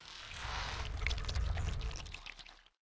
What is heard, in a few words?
snowman dead, monster